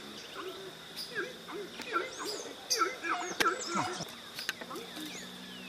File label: Horned screamer, پرنده